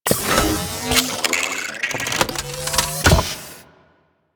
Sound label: Rodent Trap Open; Warframe; Solaris